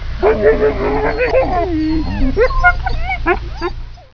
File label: Fussing puppies